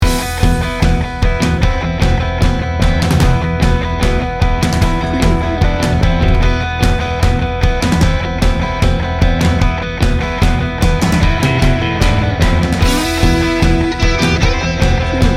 tribal rock

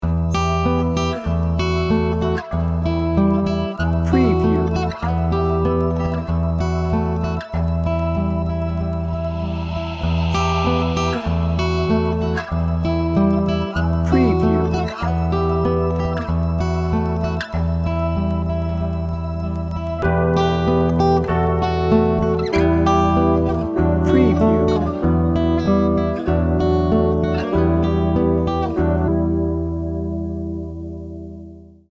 dreamy reflections

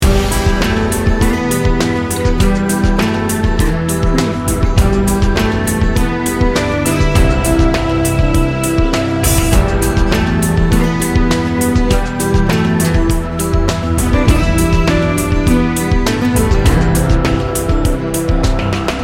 earth tones loop

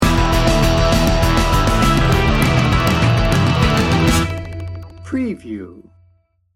power source sting bumper

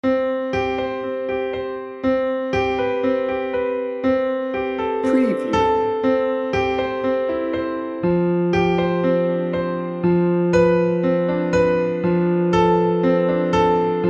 atmospheric enchantment piano only

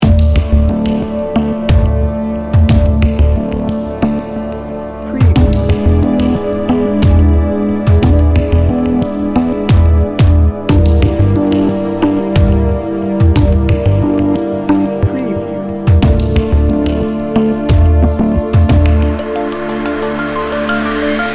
exotic mystery loop

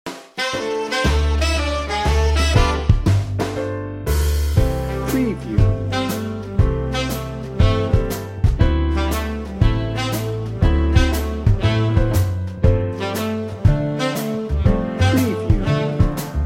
big band swing no solo

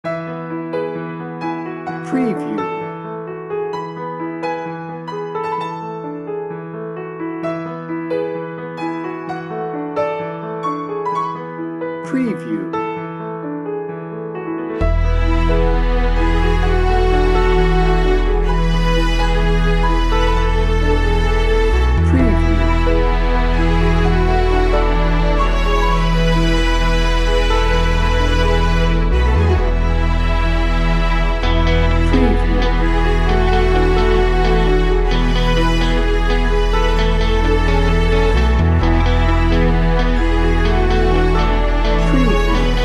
glory bound no drums

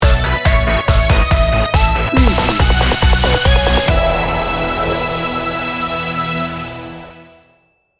positive direction sting bumper

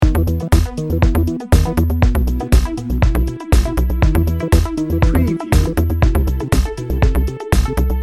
bouncy and dreamy loop

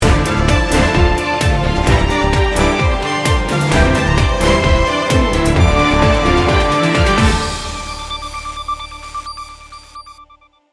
heroic power sting bumper

